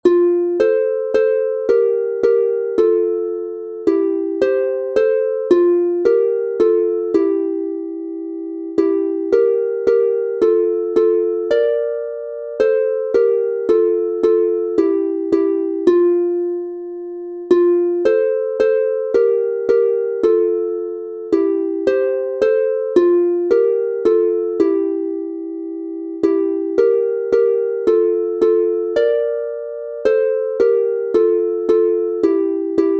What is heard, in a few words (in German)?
F-Dur 2 st instr, Harfe; Schlaflieder; Wer hat die schoensten Schaefchen